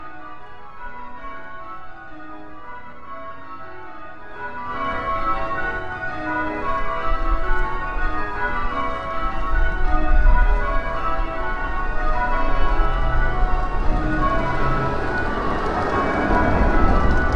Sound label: old st martin s bells with start of ringing down at end; St Martin's bells 2